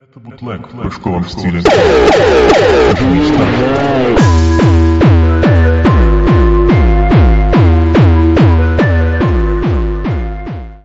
bootleg jumpstyle

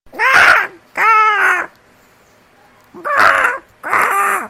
crow

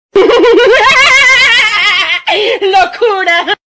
hahahhahahahah

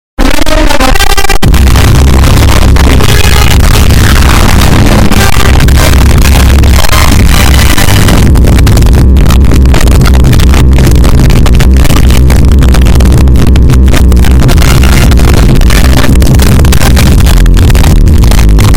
nfl extreme bass boost

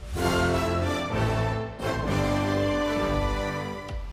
mission complete

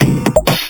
wow notification

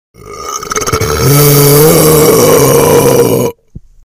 burps

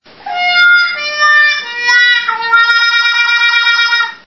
sad trumpet

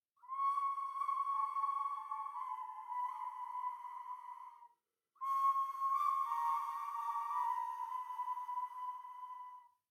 evil whistle

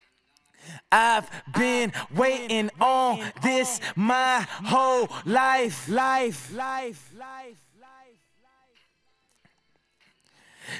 accapella

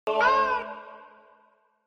meows

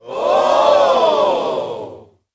crowd admiring

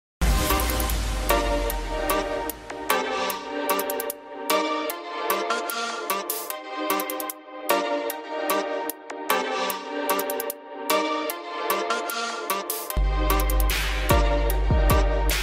instrumental

